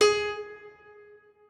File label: piano